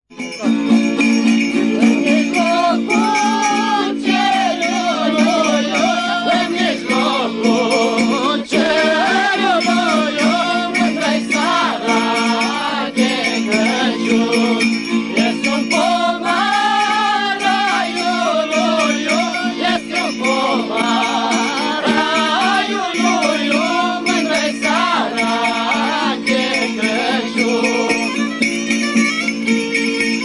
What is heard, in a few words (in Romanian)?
colind in mijlocul cerului